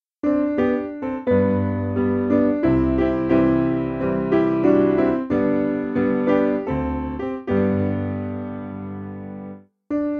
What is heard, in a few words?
Piano